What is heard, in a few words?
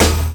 MISC PERC; E-MU Producer Series Vol. 8 – Platinum Phatt